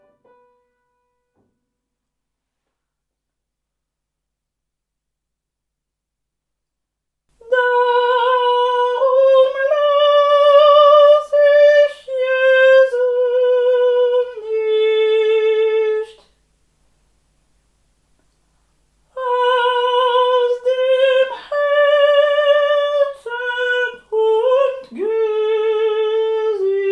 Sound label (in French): soprani; jesus bleibet meine freude